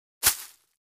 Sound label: Foliage Impact Soft; hits & impacts